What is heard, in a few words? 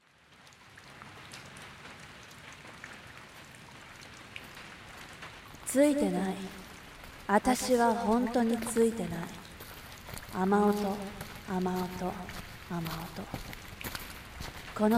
rain drama